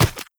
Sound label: step run, footsteps, player, mud